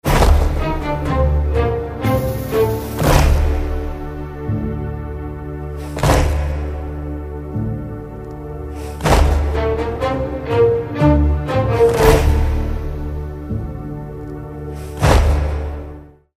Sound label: ringtone